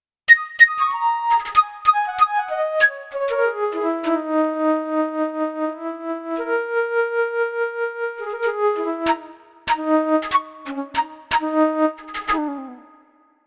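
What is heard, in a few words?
Acid Jazz Vel Flute; PC3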